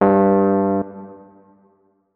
notes, keyboard, electric piano

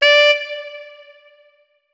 notes, saxophone; Hideout Furniture

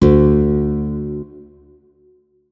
notes, guitar; Hideout Furniture

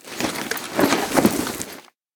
heavy, Vaulting; ledge grabbing; Ledge Climbing Mantling

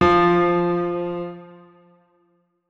notes, keyboard piano; Hideout Furniture

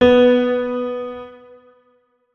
notes, keyboard piano; Hideout Furniture

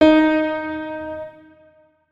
notes, keyboard piano; Hideout Furniture

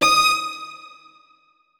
notes, keyboard; Hideout Furniture

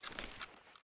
lower, weapons rattle; EFT Aim Rattle

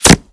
bullet collide; Anomaly Bullet Cracks and Impacts Redone

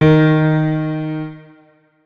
notes, keyboard piano; Hideout Furniture

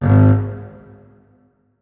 notes, keyboard strings; Hideout Furniture

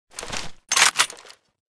aug a3 draw; Boomsticks and Sharpsticks; weapons aug

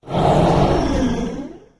invisible, monsters bloodsucker; Soundscape Overhaul

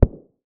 bass small, weapons; JSRS Sound Mod

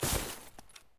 grass, human step; grass1; Soundscape Overhaul

